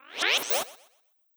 Object Upgraded

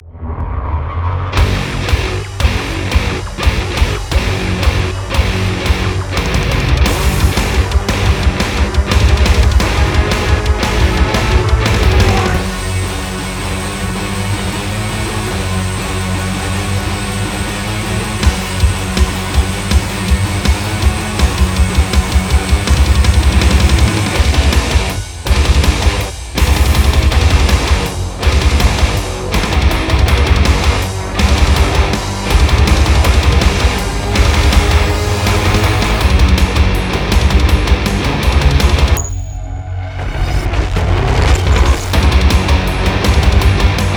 Music Fight; PositronX

